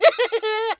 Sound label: SFX FUNNY FARM